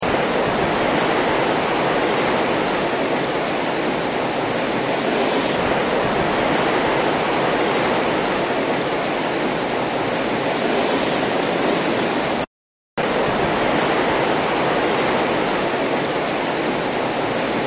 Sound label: wind loop